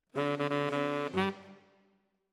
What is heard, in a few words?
Tenor, RNR for piano